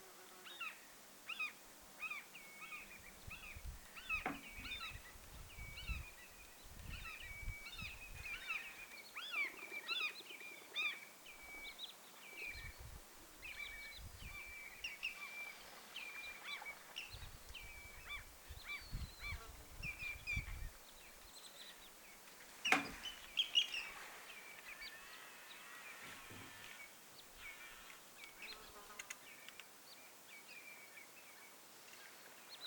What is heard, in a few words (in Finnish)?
ruskosuohaukka lentaa etelaan pain rannan ylitse